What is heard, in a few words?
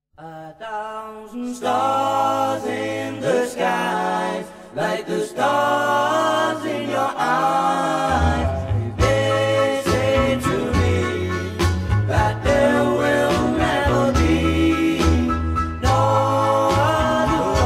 Doo Wop; Liverpool England